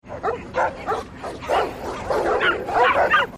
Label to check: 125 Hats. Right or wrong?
Wrong